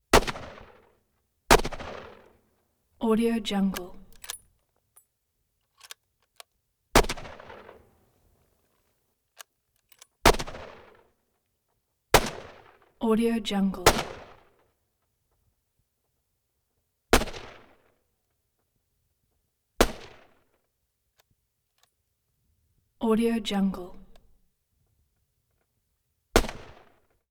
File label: winchester 3006 rifle big crack airy blast